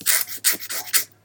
scratch

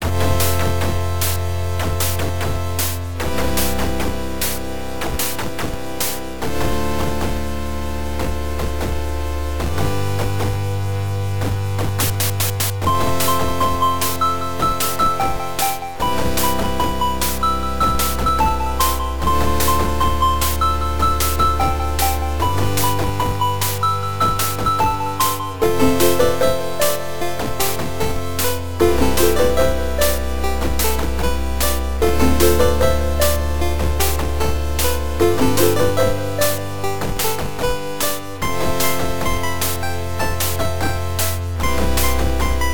town music; new music

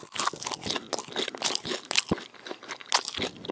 sheep eat; outsidehouse

